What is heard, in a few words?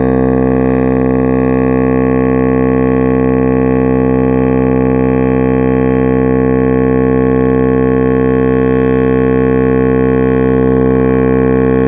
VOX Rap, Wavetables; Synthetic's Serum Collection; Standalone-Music SYMPHONY Wavetables